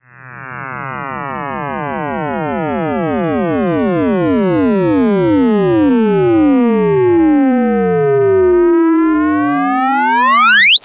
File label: Sweeps Up, Effects